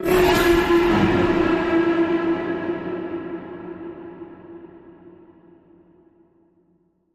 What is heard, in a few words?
Zombie